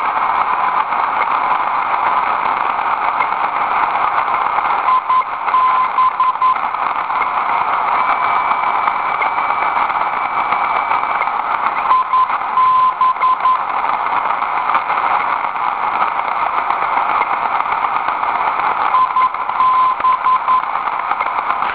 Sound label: PULSES